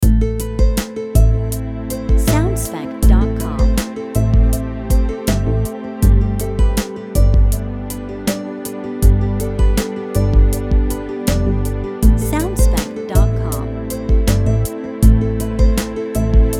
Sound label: Neutral Relaxing Main Loop